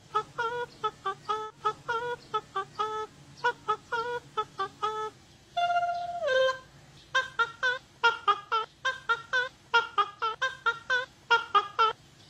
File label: Pelican